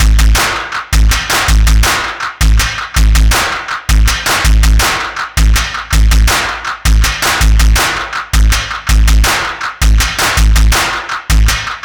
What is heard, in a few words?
drums